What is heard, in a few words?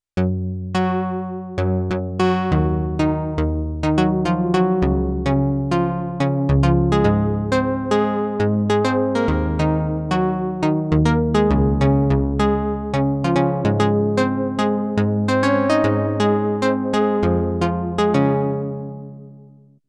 OB-X8 Slap Bass